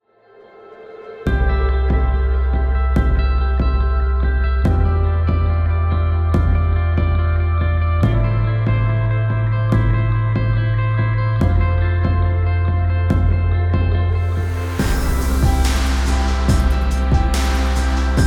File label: Walk Up Music